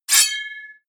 sword pull sword knife out

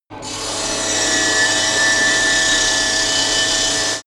saw metal free

